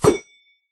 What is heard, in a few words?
throw, trident; Minecraft